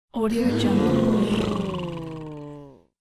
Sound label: mixed group tired exhausted